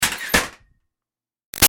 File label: Ironing Board Metal Fold Up Screech; Miscellaneous Household; Big Room Sound